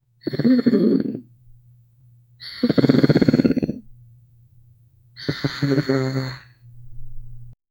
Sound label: cartoon soundscape; cozy; disney